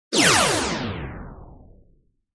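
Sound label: Laser Gun Blast Descending Sweep; laser fx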